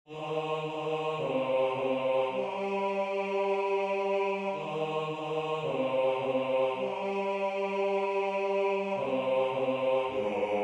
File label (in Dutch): koor Bas